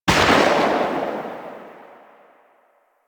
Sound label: Uzi Pistol Tail, weapons; Grn Uzi Pistol